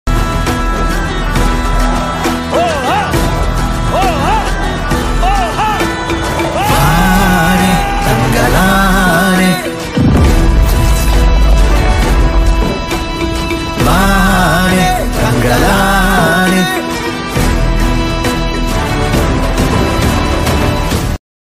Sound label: bgm ringtone; Chiyaan Vikram